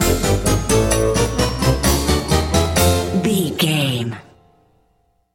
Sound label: unexpected complications sting, silly, circus, goofy, comical; innocent; naive; child like; childish; Sitcom; Dramedy